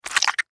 Horror Squish Suction Blood; Monsters

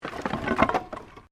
Wood Movement Minor

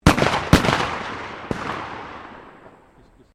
SHOTGUN 12 GAUGE SEVERAL SHOTS, Sporting Shotguns

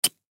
Snow Bottle Drop; Ice (Sound Effects:Weather); Blastwave

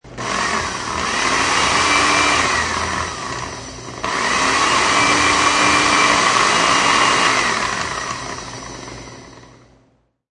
A food processor processes; Kitchen; Household

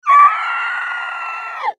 Monster Screech Alien Scream Creature; Horror; Animal; Blastwave

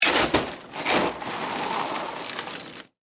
Ice Chest Scoop, Restaurant; Miscellaneous Bar

